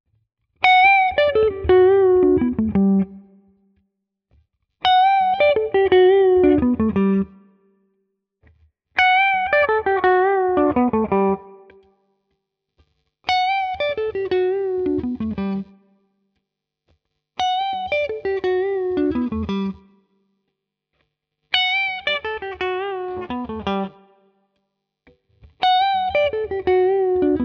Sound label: Hagstroem clean Lick all positions